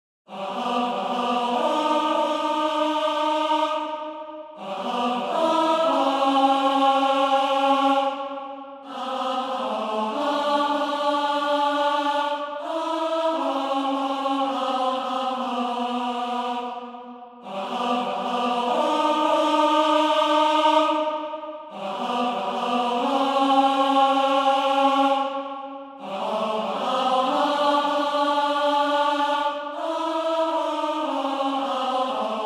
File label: Tenor